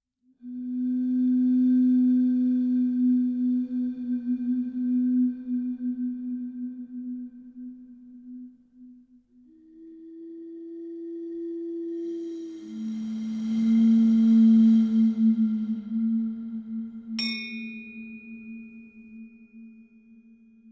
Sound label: rain